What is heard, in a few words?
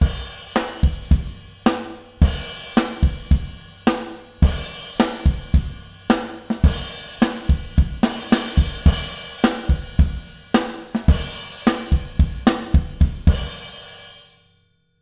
Reverb - Drums - Bright Micro Room; CRTIV Reverb